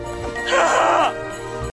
Ahhh

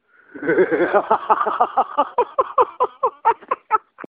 Hee Ha Ha Ha

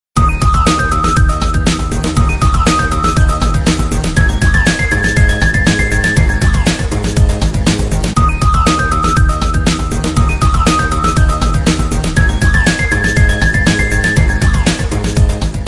Chasing Tone

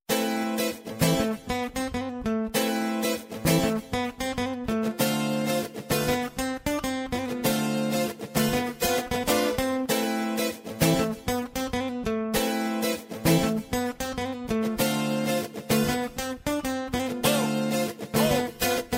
elegant

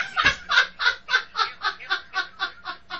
Hahaha

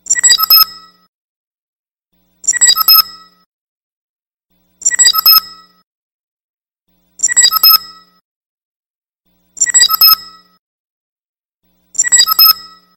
Chime; TNG

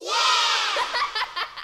Kids

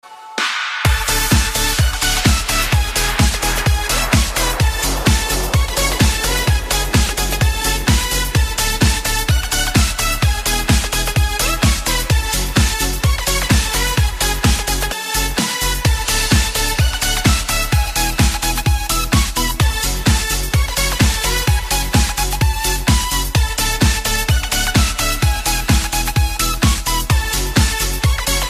Electro House

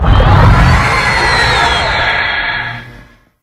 Mothra Roar

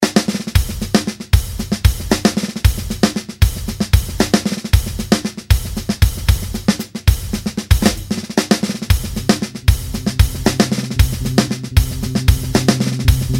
Drumline

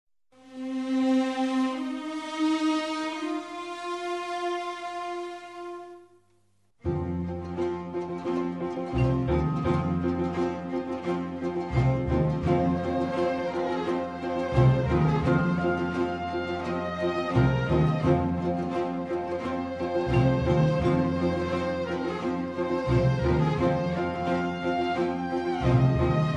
Mongolian Melody

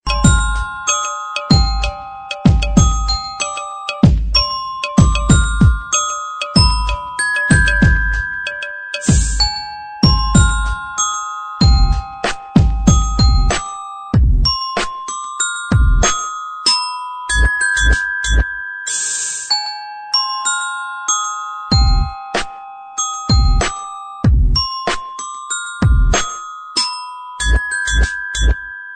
Bell

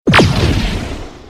Plasma Rifle

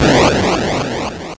Explode; 2600

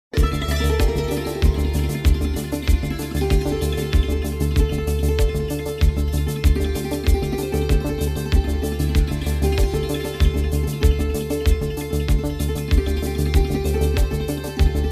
Santoor

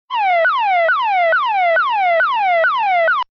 Chirp

